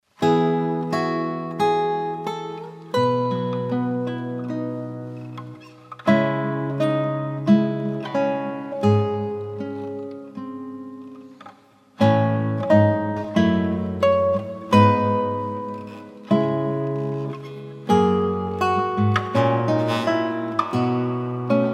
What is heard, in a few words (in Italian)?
postludio chitarra; Notte di luce